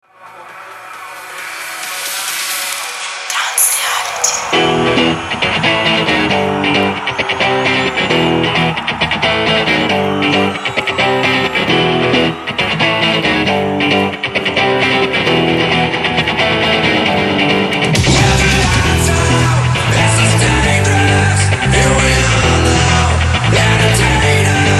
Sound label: ringtone